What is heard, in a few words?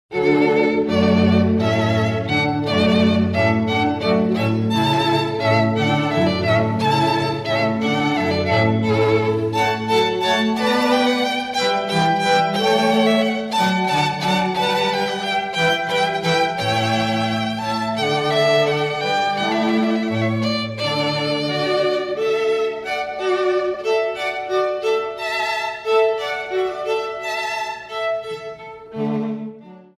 Hornpipe; Handels Hornpipe